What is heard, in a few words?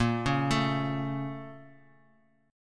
Bb Minor Major 7th; BbmM7; arpeggiated; x,13,11,14; JGuitar